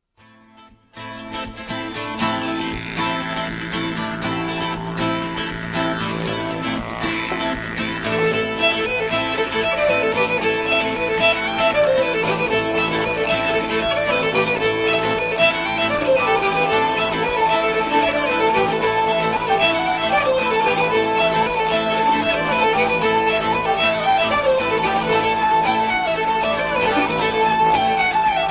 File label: Celtic Guitar